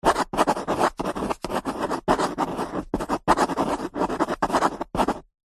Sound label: pen writing on card back of paper pad; office